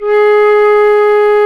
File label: flute, C flute